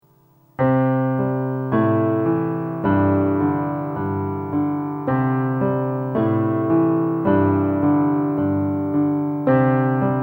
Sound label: solo piano